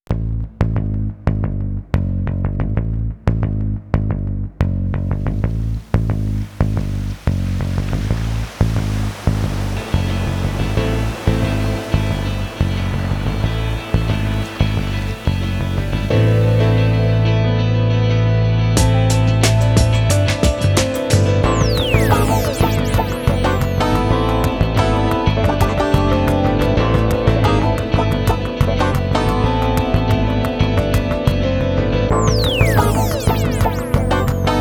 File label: Jazzy Feel Night Waves 90 BPM; Humming3™ MUSIC